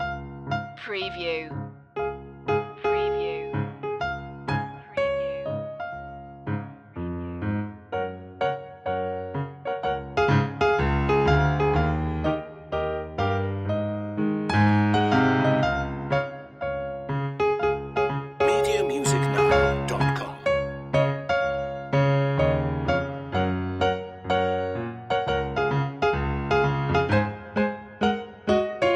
piano loop; jingle bells; media music now

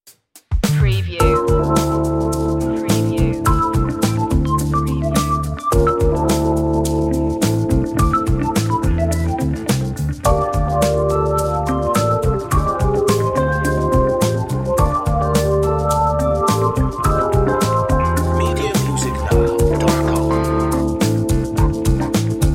acid jazz

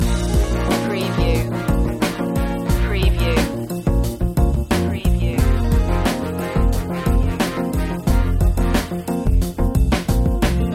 lounge living loop

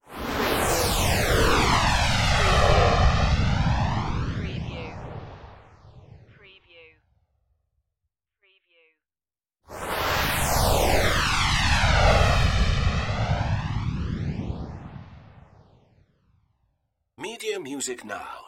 jet ignition fireballs

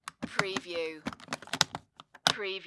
Makeup Kit Lock Case

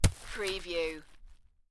Stone Fall - Drop Rock On Dirt

